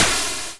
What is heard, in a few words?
soft, explosions